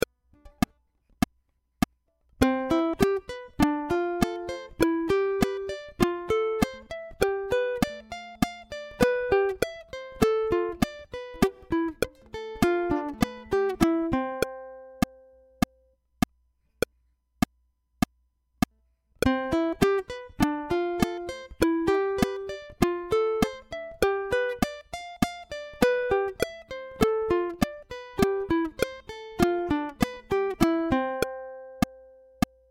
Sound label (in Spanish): C Escala Back Click